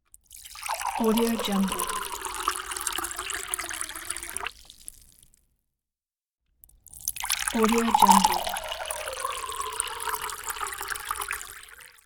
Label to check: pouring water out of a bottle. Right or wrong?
Right